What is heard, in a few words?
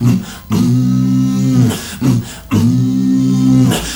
Male Hum, Hummers; Spectrasonics; Heart of Africa 2